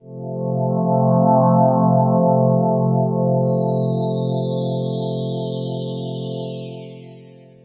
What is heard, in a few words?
chords